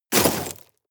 shovel dig impact hard

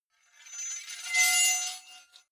sword blade screech high

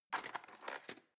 cutlery teaspoon drawer scrape

